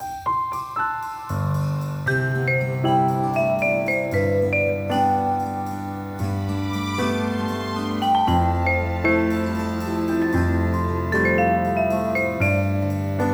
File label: Winter Horns; Kirby and the Forgotten Land